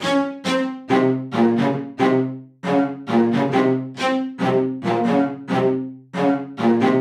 String 137 Cm Dry

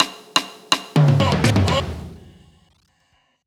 Drum Fill 125 BPM

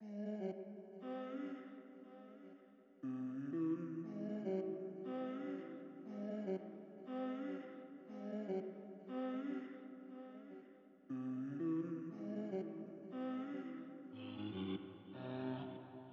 119 C m Vox

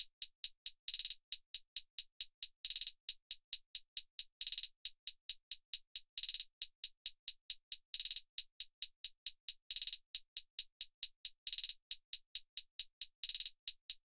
HH 136 Dm Wet

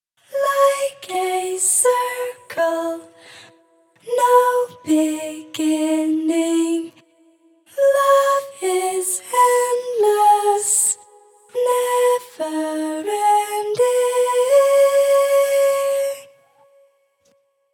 128 C m

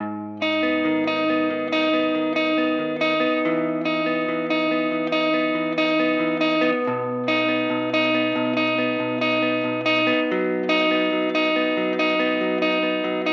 Rhythm Guitar

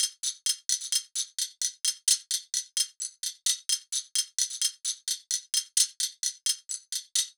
Percs, 130

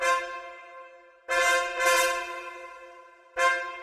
Bells Trumpets

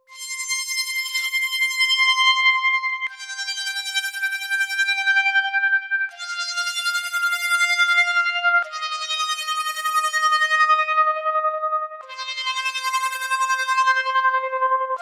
80 lead Ebmaj; farallon

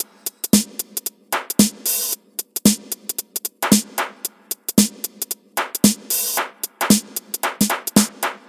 Drum Loop 113bpm; Zenhiser

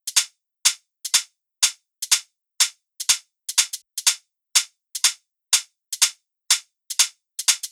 Closed Hat, 123

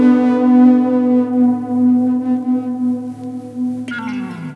Guitar Dirty Mellow

